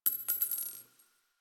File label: Target Practice Shell Casing; Quincy; Warframe; Quests; Nineteen Ninety Nine